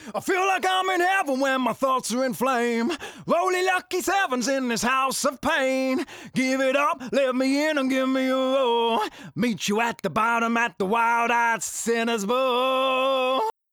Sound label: Vocals On lEAD vOX; Compactor